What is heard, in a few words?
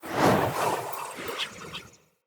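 Staff Caster Projectile Whizz By; Weapons; Player; Staves; Starter Staff Caster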